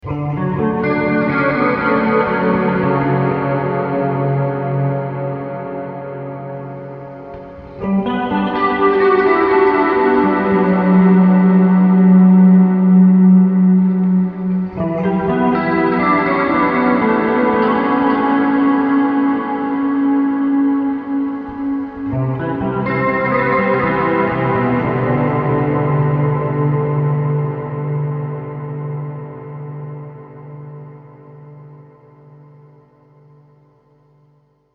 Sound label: Volume Swells